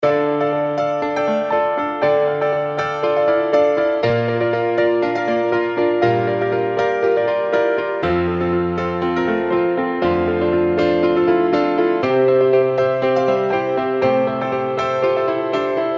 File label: piano jams